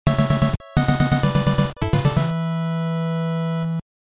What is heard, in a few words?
finish level; Contra